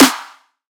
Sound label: Riddim Snare Clap; Snares & Claps; One-Shots; Ghosthack; Riddim Essentials Volume 2